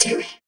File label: SYN VOCOD, dance synth; Sound & Vision - Gigapack I CD 2 (Roland)